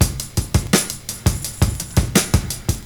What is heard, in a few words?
OLD SCHOOL, Breakbeats